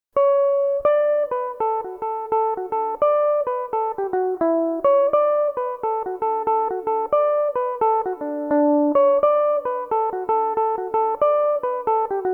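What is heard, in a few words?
D major, Irish mandolin; Up In The Garret I Am; Belmuse EM-190 electric mandolin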